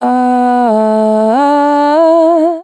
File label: Aaahaaa, Chants; Vocals